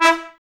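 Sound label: trumpets E, brass; 90 s Sample CDs; Miroslav Vitous; Woodwind Brass Ensemble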